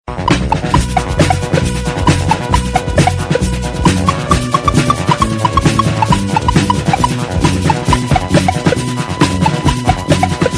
popcorn

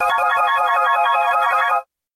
ring; cyanogenmod

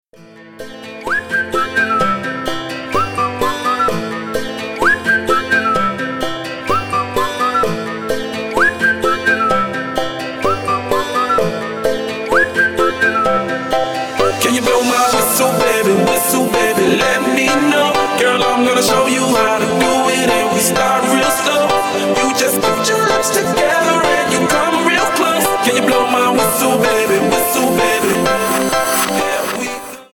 whistle

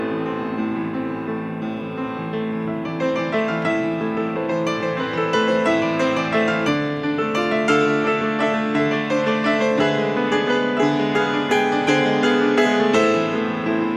piano cover; pans labyrinth

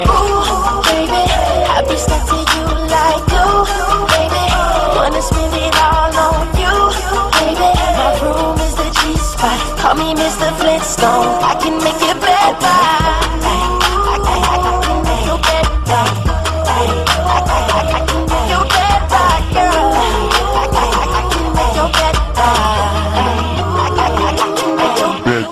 bed rock

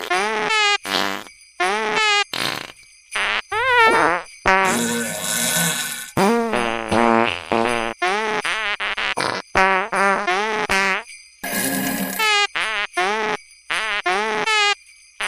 jingle farts

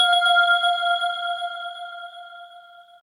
samsung galaxy s3 booting

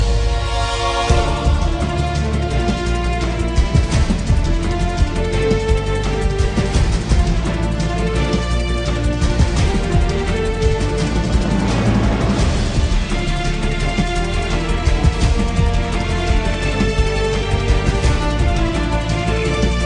ringtone; mgs4

